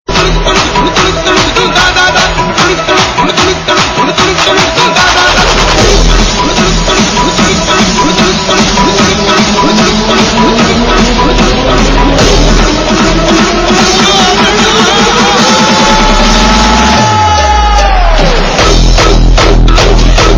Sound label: hardbass